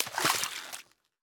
炼药锅染色声

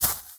下界苗踩踏声

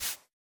刷子刷扫声

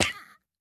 海龟幼年受伤声

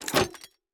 宝库插入失败声

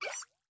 美西螈空中待机声

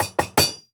锻造台使用声

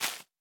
海绵破坏声

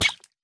美西螈受伤声

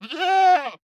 山羊待机声

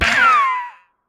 海豚死亡声

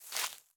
大型垂滴叶向上倾斜声

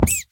兔子受伤声

